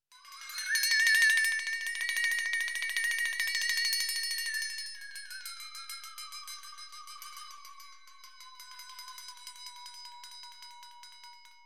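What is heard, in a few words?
comic ghost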